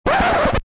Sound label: scratch